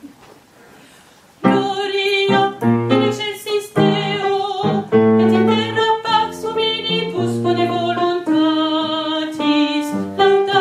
gloria mezzo

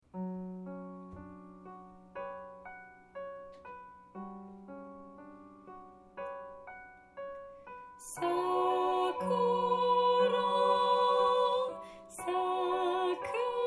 mezz

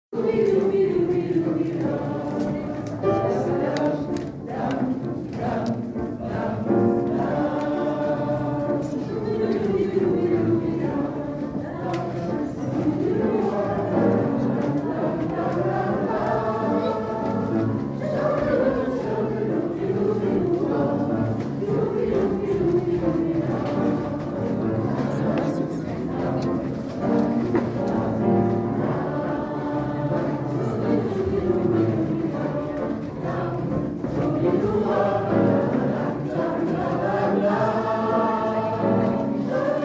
canon swing; Doeba Canon jazz; Choeur de femmes; choeur Didon

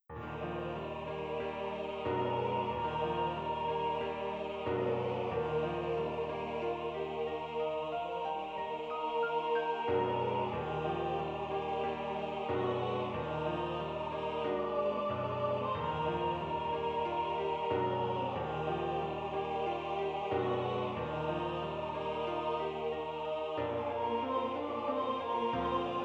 tutti piano

